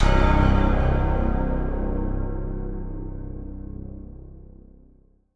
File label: Horror Sting; Apollyon